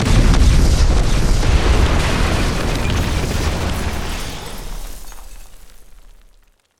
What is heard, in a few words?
explo bomb; PremiumBeat; Explosions